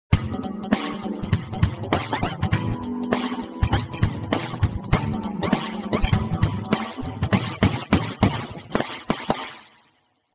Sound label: Pop